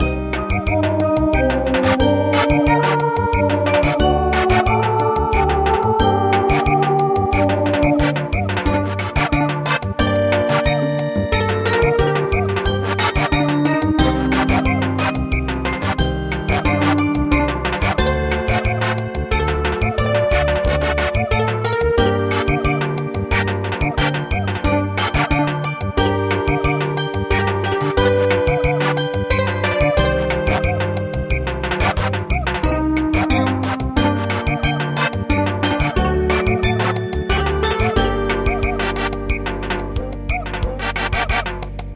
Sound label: Samba; Dandansoy